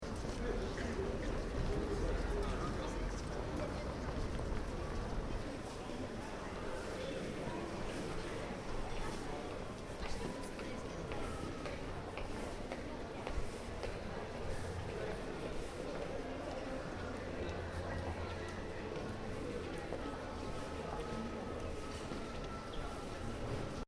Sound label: Shopping Mall, Interior: Ambience, Small, Uk; Backgrounds; Stores and Malls